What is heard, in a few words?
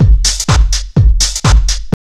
HOUSE 124, house loop